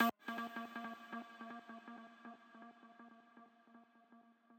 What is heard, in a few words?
arp